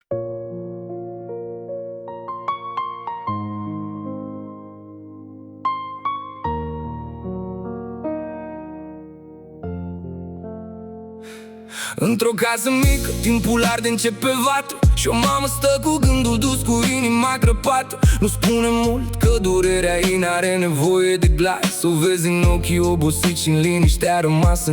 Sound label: Rap Nostalgic